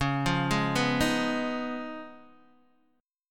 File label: Db 9th Db down Guitar DADGAD; arpeggiated; JGuitar